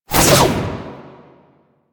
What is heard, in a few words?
Backflip Whoosh; Enemies; Foley; Generic Foley; Warframe; Duviri; Dax